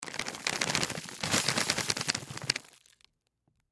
Pretzel Bag Shake, Foley Pretzel Bag Shake Food; Food Sound Effects; Food (Sound Effects:Household)

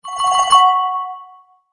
multimedia tone alert; Tones (Sound Effects:Sound Design); McKinney Sound

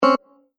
Incorrect Wrong Noise

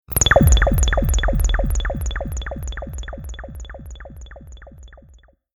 Impact Stinger Wet Synth Delay Future Space; Sound Design; Stinger Sound Effects

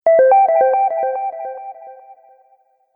Soft Subtle Notification; Musical

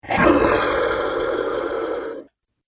Dinosaur Snarl Growl; Dinosaur Sound Effects; animal sound effects

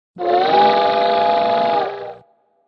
Train Whistle, Single train whistle.; Trains

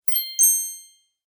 Sparkle Interface Sound, beep sound effects

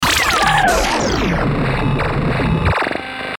Stinger Short Zap Descend; Stinger Short Descend Warble Zap

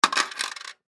Coin Nickel Drop Drawer; Foley; Money; Objects; Blastwave

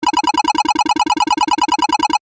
Retro Game Repeating Sound; Video Game Sound Effects

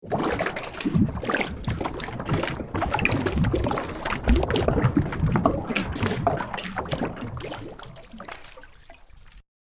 Fantasy Crazy Water Spirit; Ghost Sound Effects

